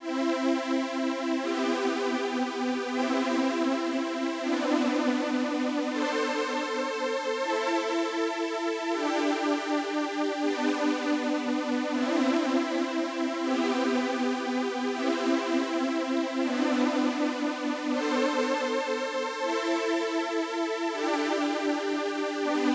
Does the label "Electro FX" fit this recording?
No